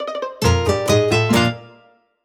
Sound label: Jingle Opening Puzzle; clubhouse games 51 worldwide classics 2020 switch gamerip